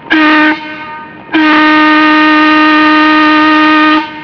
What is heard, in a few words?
2 organs, steam